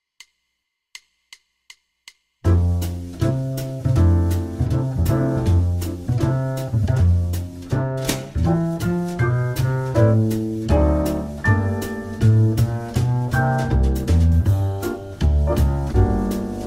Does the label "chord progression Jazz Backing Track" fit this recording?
Yes